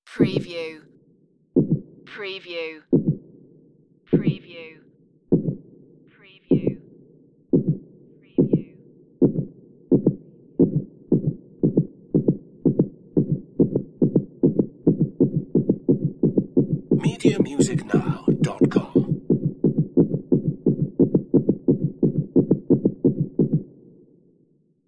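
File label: Heartbeat Speed Up Low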